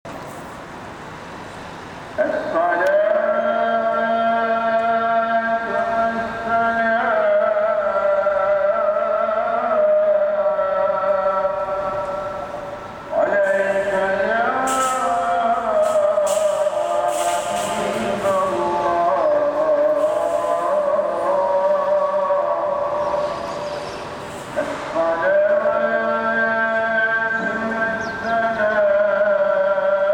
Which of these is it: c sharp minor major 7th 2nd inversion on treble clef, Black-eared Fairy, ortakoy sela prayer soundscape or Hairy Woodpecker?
ortakoy sela prayer soundscape